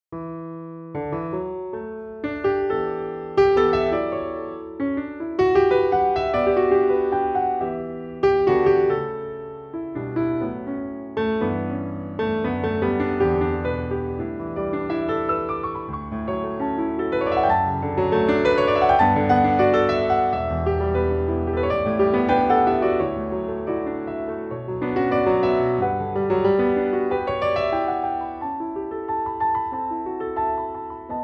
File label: Piano Improvisation